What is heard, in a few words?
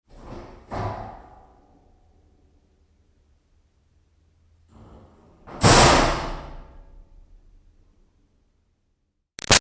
Door Metal Milking Vat Med Far O-C; Hollow; Doors